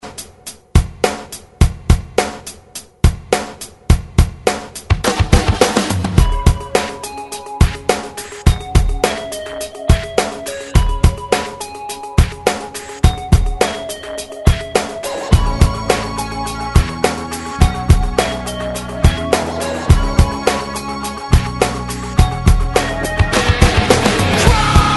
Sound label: Alternative Rock 105Bpm; DJDOLLS